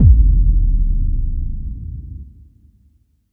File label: Kick FX